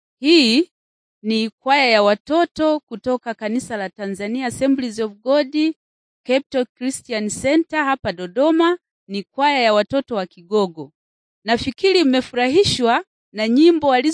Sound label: childrens choir gogo; chigogo; bible society of tanzania nt drama